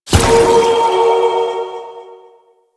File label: Spirit Primary Fire C; Warframe; Grineer Thumper Bot; Sentient Spirits